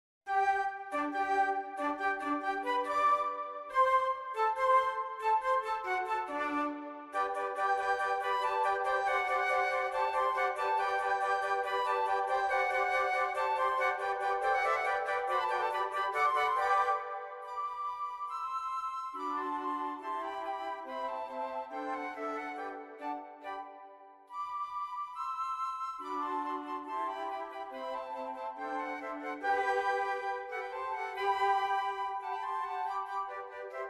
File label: Flute Quartet; abridged arrangement for flute quartet of the first movement of Mozart's 'Eine Kleine Nachtmusik'